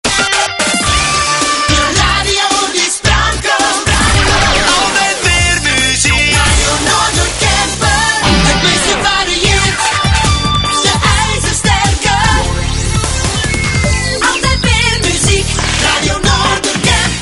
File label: Jingles